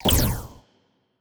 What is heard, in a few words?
fire rate laser muzzle, weapons; Stellaris; biogenesis